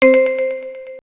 Zoom Out, Camera

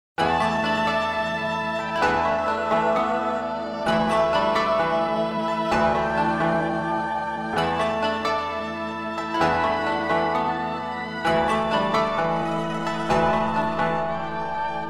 Chinese background music, Nokia; Audio themes

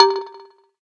electric, keytone

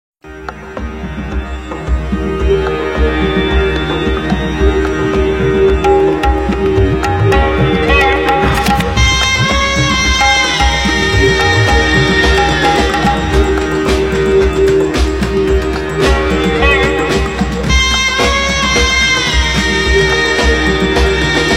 Indian rhythm; Nokia N92; Asia exclusive

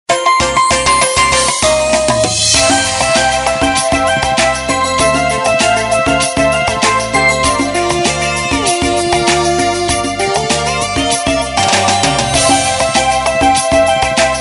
Ringtone; Samsung GT-S3500H

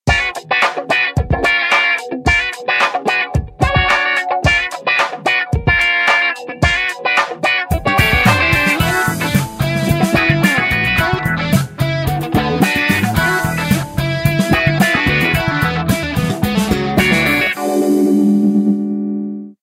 Funky Time, ringtones

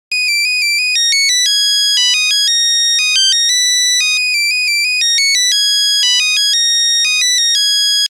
Elise, Nokia Buzzer; Windows Phone apps

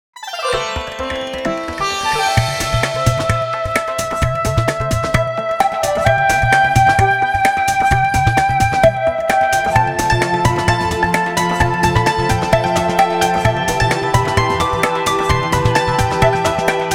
Banshi Fusion, ringtones; Walton; Primo-F3i

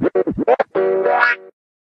stutter, notifications; Sony; Xperia Z3

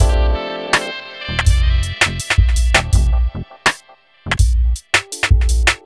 RnB; Google; NewWave Labs

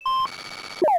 Beep Stop, camera video rec sound; SKY; IM-U450L; System Sounds

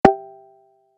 face unlock success; HAPTIC SEC FACEUNLOCK SUCCESS; Sharp; AQUOS wish4 SH-52E

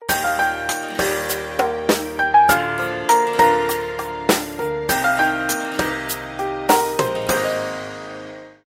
Home, alarms; Meizu MX4

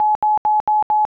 System MSG; LG TU500; AIM; IMTone

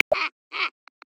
Snowy Owl; Xiaomi Redmi-8A

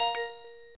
Camcorder Stop

